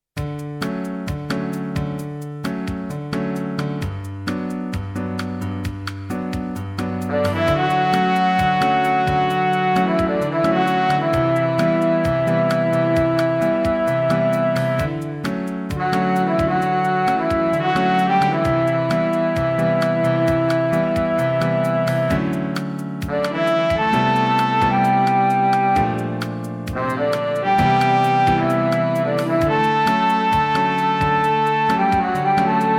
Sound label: cafe minus bass